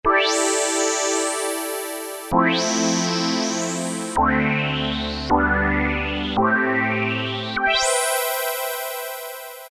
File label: Reverse Sweep, Korg Poly-800